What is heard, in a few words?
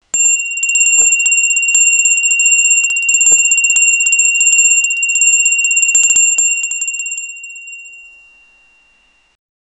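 samsung galaxy s3 ringtone; mobile phone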